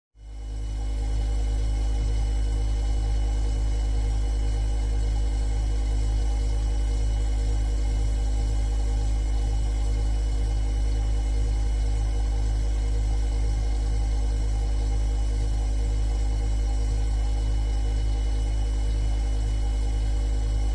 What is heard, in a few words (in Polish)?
Lodowka; Chomik Heniek Wielka Wyprawa